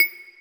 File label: press, interface; redeclipse